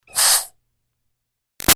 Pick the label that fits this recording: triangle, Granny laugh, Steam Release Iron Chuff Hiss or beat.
Steam Release Iron Chuff Hiss